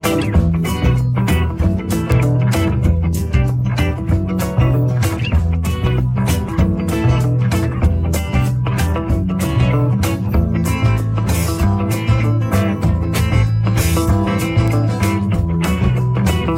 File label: instrumental; demixificateur